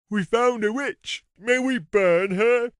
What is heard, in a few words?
witch